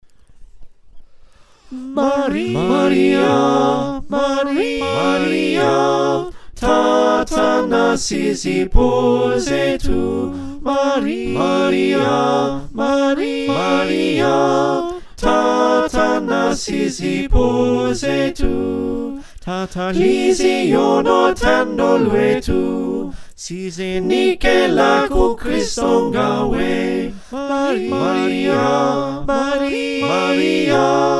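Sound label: soprano alto tenor bass, choir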